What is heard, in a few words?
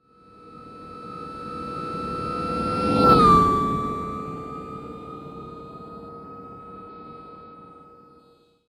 HOOVER; AKAI Anime Sound FX; FLYOBJECTS